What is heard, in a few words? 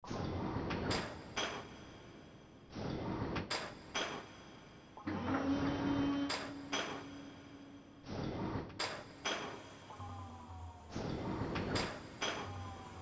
Industrial World ambience; Lode Runner On-Line: The Mad Monks' Revenge